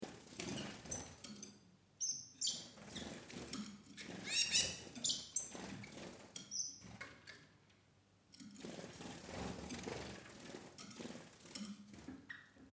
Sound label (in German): Voegel